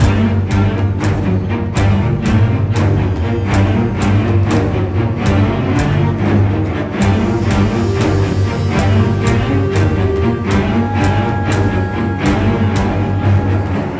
String; Assassin's Creed Unity Dead Kings

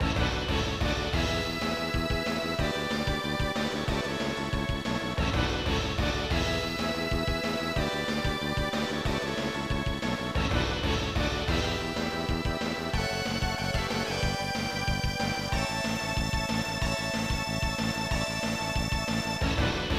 Goal; F-1 Grand Prix Part III; SNES

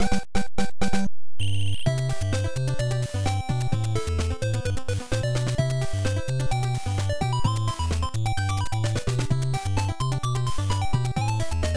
A Rattle Samba; Bio Miracle Bokutte Upa; Family Computer

